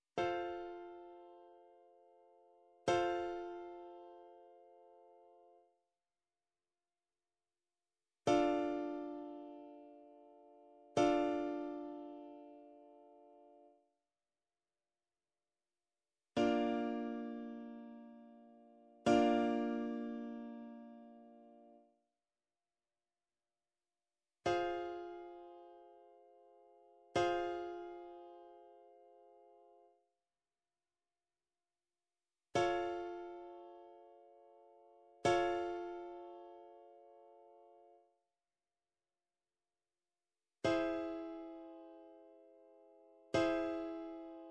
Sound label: Recognise Chord in Root Position; Grade 4; Root Position M m M m A A Dom7th Dom7th